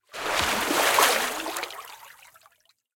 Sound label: fish Intro; imperialriches